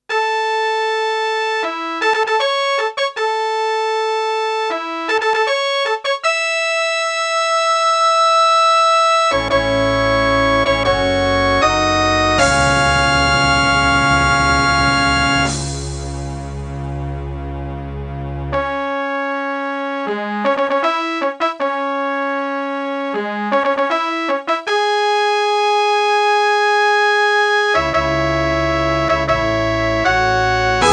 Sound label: fanfare